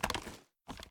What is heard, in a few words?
reload pull; reloads; gmod